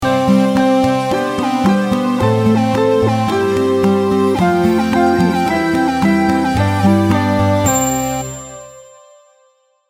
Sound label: twinkle twinkle little star sting bumper